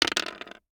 DICE on Hard Wood, Throw and Roll, Standard, 2 Two Dice; Samples; ArticulatedSounds; DICE Sfx Party (Extended)